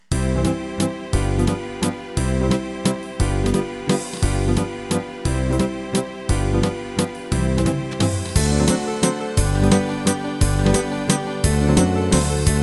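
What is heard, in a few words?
Viennese Waltz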